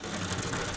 Rough Metal Scrape Textured

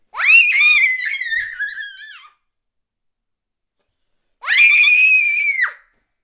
Small Girls Screams

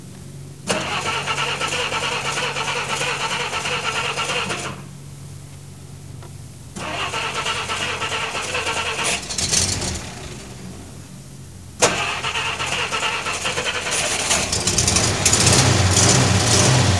1961 Plymouth Valiant Trying To Start

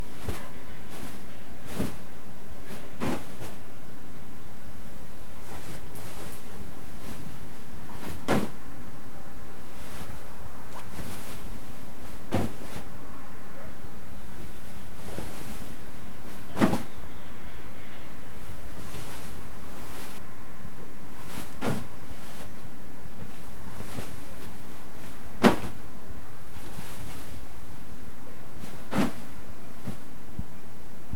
Pillow Throw

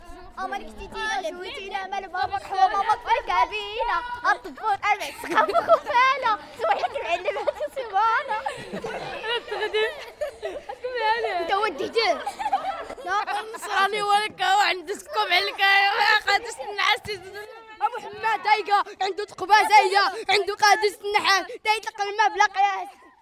Morrocan Kids

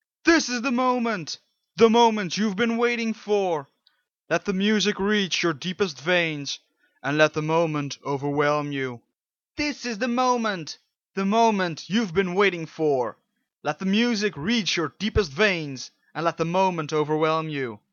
This Is The Moment Hardstyle Sample; Kendona

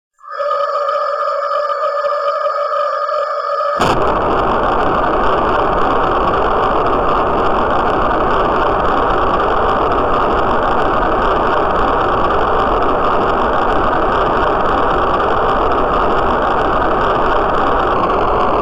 Cooking With Gas Like A Rocket

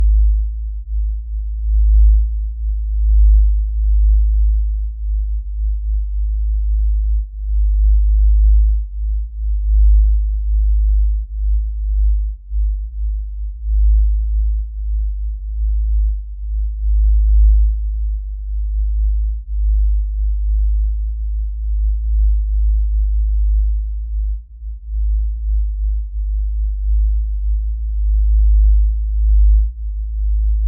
Wild Stereo Sub

